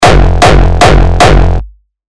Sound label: 909 saturated hardstyle kick